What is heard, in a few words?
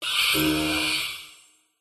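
playful horror negative hit glitch robotic technology hi tech futuristic game tone sci fi; science; ufo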